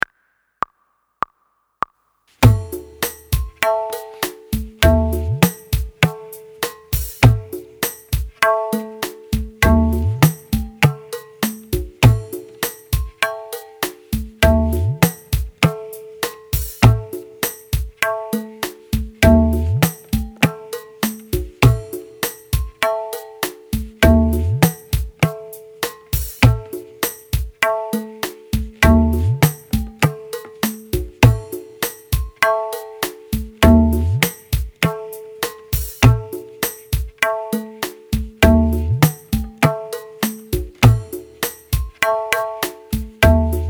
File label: Di Practice with Slide Da Gi and Na With Tabla